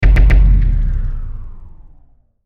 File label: Hit Multiple, Hit Multiple Power Down; Blastwave